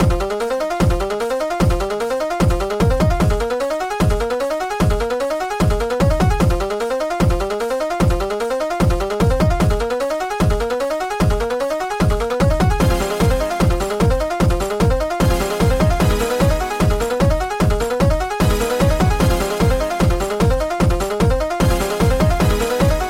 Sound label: Chiptune Razor Thin Looping; SoundsCrate